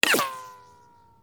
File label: bullet ricochet